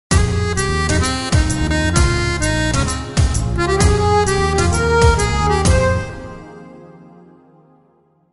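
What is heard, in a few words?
accordion; geo tv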